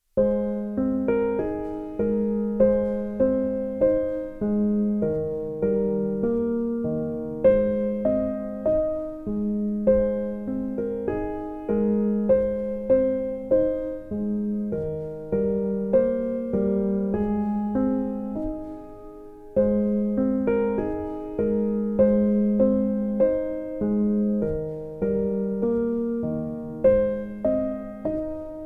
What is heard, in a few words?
Piano Version; Mary Had A Little Lamb; Tiny Tunes; Narrative Mind